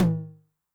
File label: HI TOM, Noise, 909